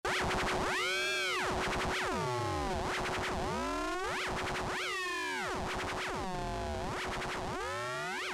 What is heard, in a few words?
modulations